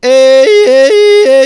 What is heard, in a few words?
yodel; SDIF; ICMC2000